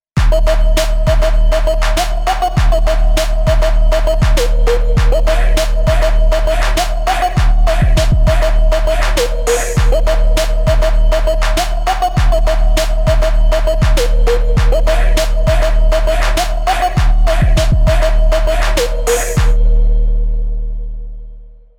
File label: Zoom AR-48; Twrk